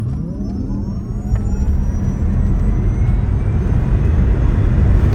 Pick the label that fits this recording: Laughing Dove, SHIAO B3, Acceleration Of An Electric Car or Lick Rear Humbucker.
Acceleration Of An Electric Car